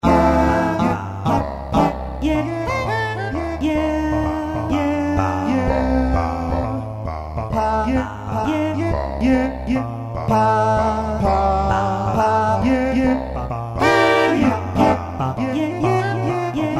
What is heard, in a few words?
VOX, Kurzweil K2000; effects; Kurzweil K2000 Value Pack; Xtra Programs; Jord Vox